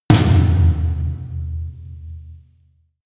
huge tom hits

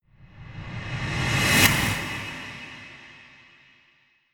hit hiss reverse, Whooshes

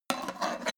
small metal scrape bounce; industrie wirtschaft; metall

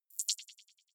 click decay, signale

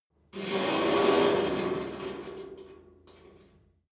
metal squeal saturated rough; industrie-wirtschaft

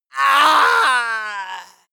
male death cry; soundpool

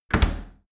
dry cleaner close; maschinen

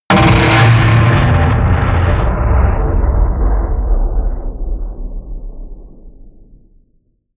creaker

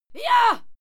female fight scream yah; schreie; menschen

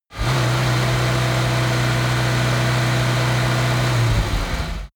car dacia driving high rpm

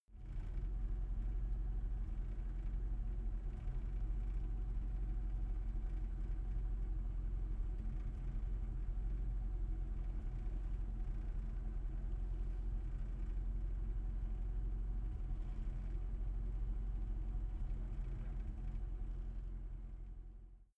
car jaguar e-type idle interior

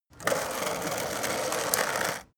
skateboard indoors drive light; sport freizeit

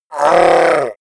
orc call pain

